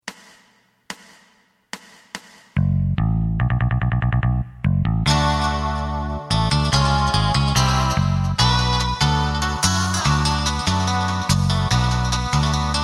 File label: drum